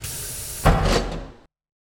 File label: metal door; svencoop; mustard